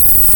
BOWED

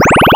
BELL

